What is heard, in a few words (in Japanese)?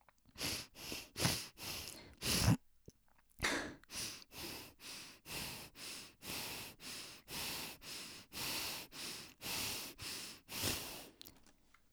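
呼吸音（鼻呼吸）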